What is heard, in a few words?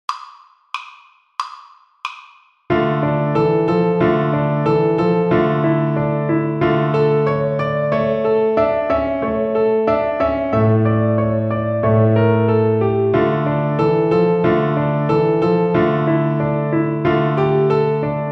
String Gym, Piano Accompaniments